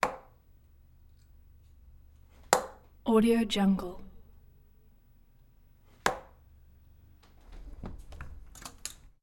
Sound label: sports darts sports interior darts hitting board